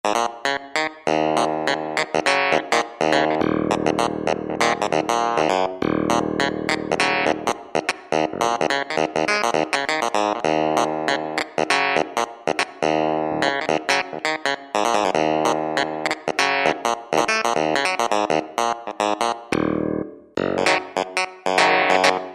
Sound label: Pf, Yamaha EX5R; Factory presets